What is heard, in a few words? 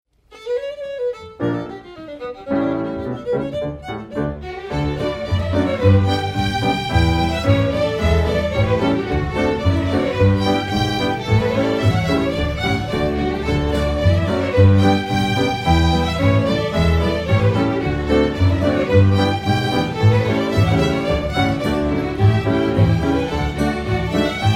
Polka